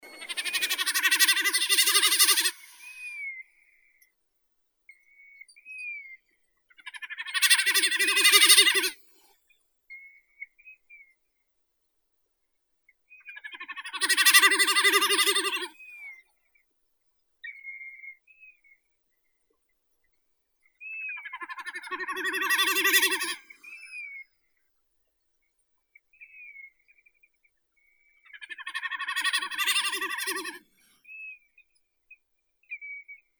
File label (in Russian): bekas gallinago gallinago